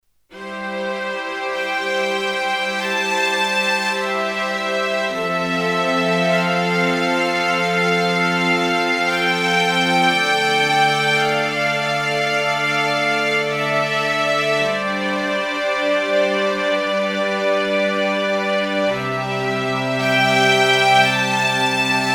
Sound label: Roland FP 60X Chamber Strings